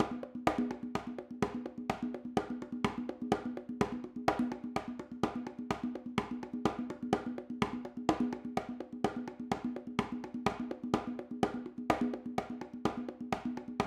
Gym Conga; unsighted

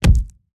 Mech Footstep Base

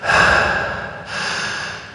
Sfx Basketball

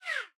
hookshot Zip Out

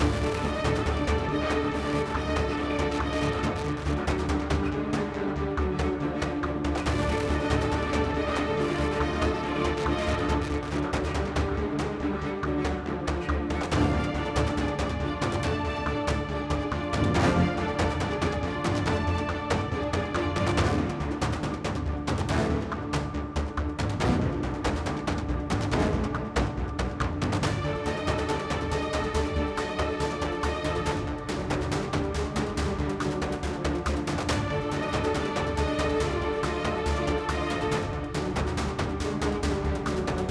Action Loop; Kamikaze Lassplanes

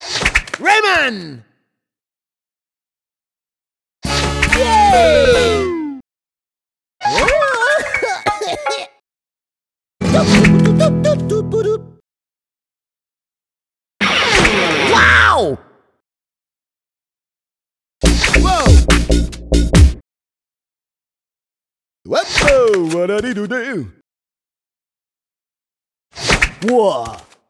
Jingle, Changing Costumes; rayman raving rabbids playstation 2

